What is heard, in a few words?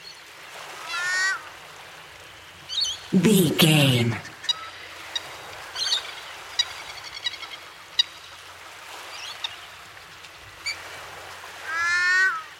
ducks birds lake ambience water flowing loop